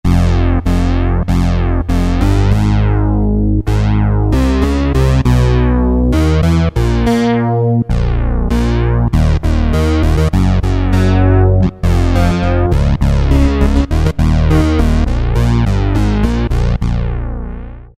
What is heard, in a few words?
Synth Bass, Roland Alpha Juno-2; Factory B